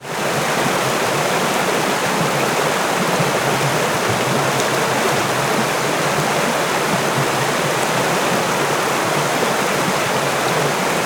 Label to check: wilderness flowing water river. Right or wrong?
Right